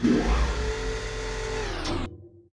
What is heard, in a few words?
panel; blitzkrg